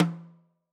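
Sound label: Son Snare Off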